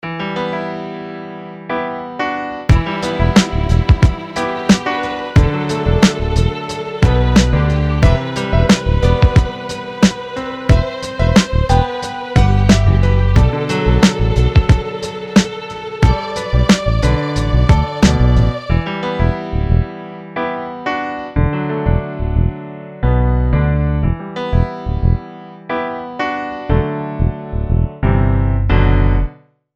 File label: Urban Cafe